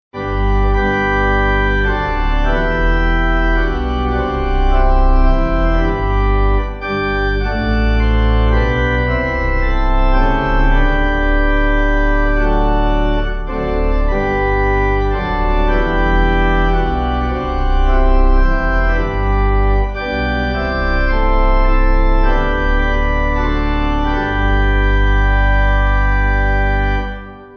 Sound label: Pipe